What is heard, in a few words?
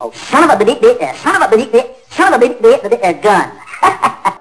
death; openffa